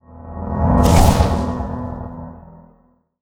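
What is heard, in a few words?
spell restoration cast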